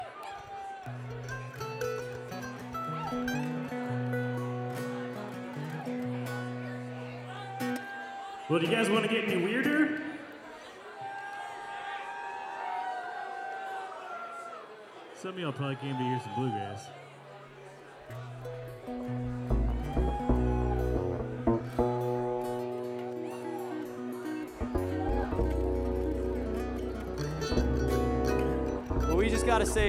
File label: Crowd Banter